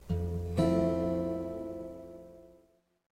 f maj7 open1

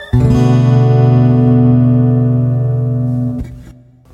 b min chords x20202, guitar chord

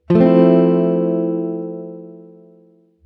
fmaj7, guitar chord

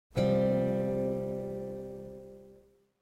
c sus4 open

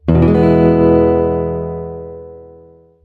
e major open

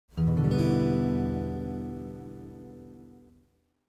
e min 3, chord box, guitar

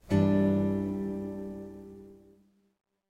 g 6 open chord

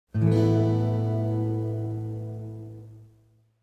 a sus2 open

